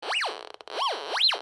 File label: metal detector